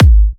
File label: Kicks G#; markus hakala